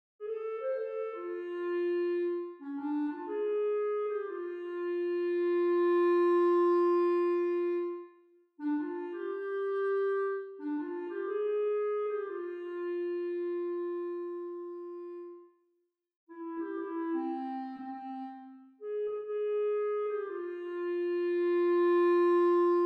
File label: blues